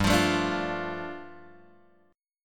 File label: G 7th Suspended 2nd G down Guitar Open G